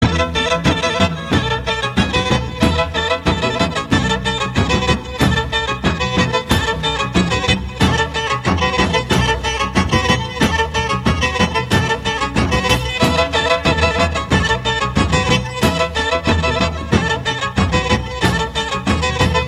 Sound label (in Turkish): kemence